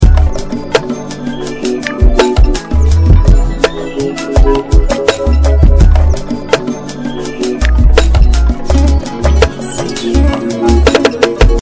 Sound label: Dreamlike Spanish, 83bpm